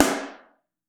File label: SN3 Mono, snare; Ableton Foundation FreePacks; Snare Designer